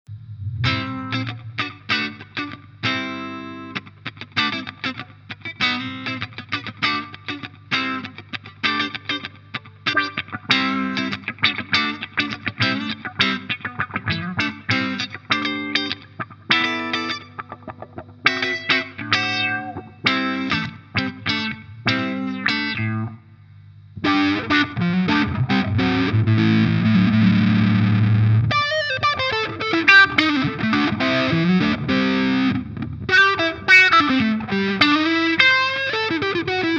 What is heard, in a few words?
dreadbox Kinematic Guitar